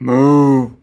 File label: cow; dead; animals